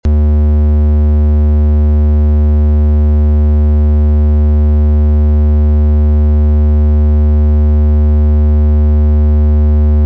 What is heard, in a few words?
ELF 7.5; Brainicity